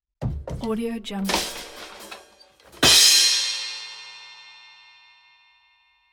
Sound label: crash layer drum set